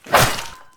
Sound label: sword slash; ragemap2024; grunt